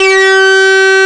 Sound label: SYNCHRO; East West - Global Sounds; THE CAT